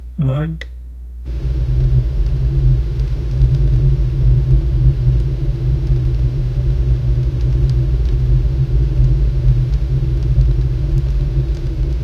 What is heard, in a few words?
wind howling outside soft; ambiance